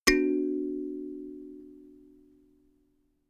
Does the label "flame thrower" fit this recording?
No